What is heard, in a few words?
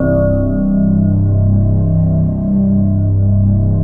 synth bell; VFXSD ANNA